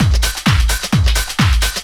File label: groove, DRUMLOOPS